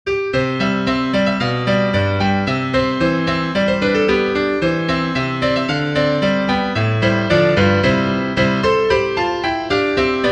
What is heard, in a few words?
piano